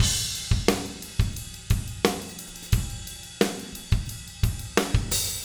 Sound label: 088 Rock Drums Chorus; Pop Rock; Moderate Tempo; Pro Tools Essential Loops; Digidesign